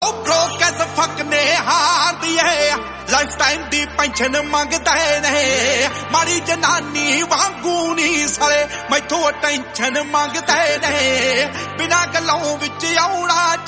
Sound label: punjabi song